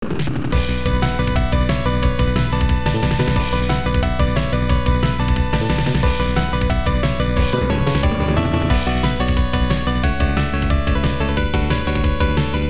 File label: Stage 1 BGM; final soldier turbografx 16